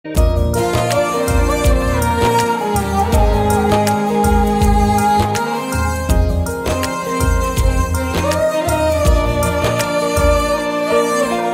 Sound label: INSTRUMENTAL MUSIC BGM RINGTONE